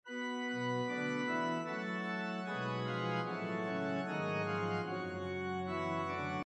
Organ

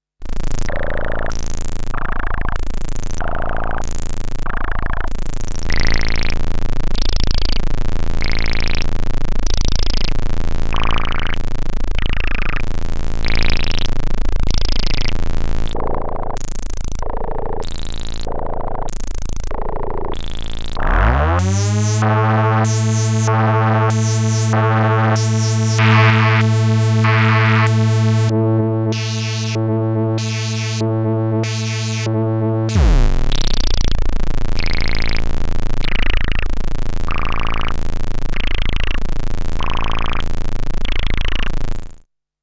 Vermona; Vermona 14

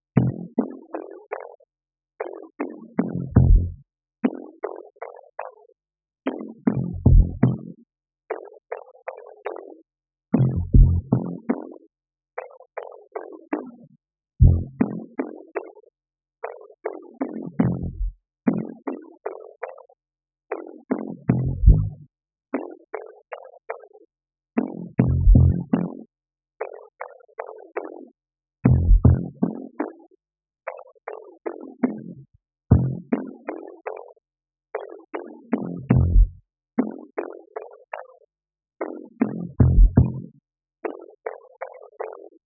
LFO Madness; Theta

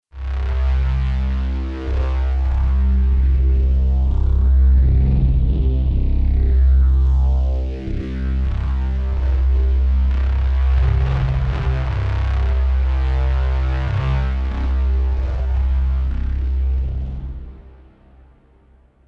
Envelope 4 Bar Blaster; Elysion 2

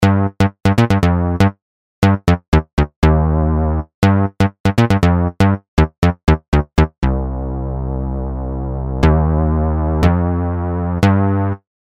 Fake Ana Bass

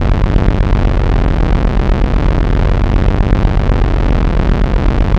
Bass Tritone; Sonic Projects

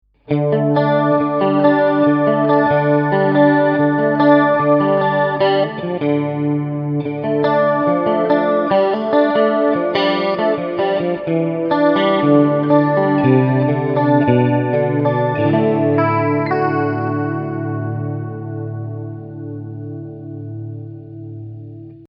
chorus clean

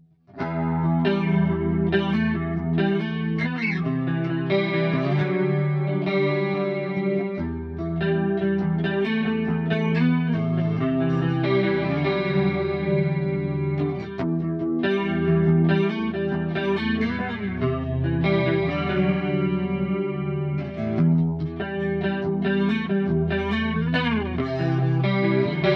Chorus; Eventide Tricerachorus; Raven

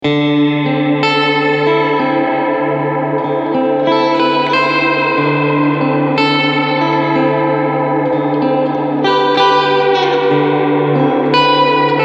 Texture Amp

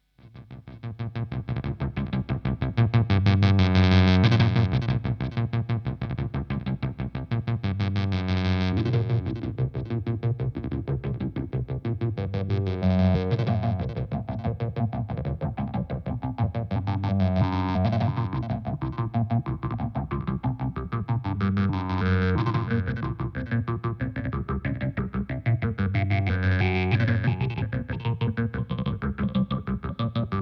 Dreadbox Lethargy

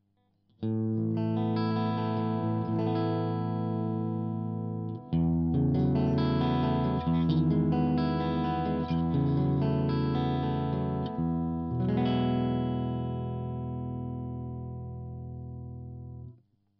clean Position 3 C414

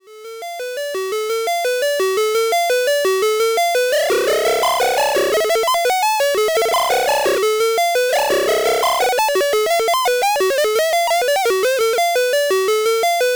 Behringer Modul 110

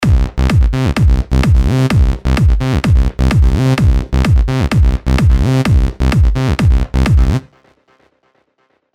Dance Seq; Nexus 3; Benny

